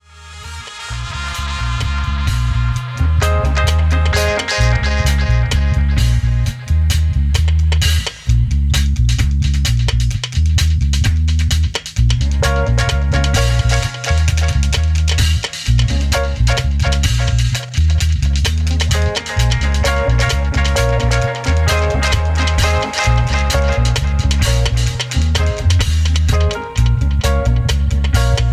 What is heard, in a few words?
Israeli Horn Chant